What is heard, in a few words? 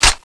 clip in; tfa cso; m95 ghost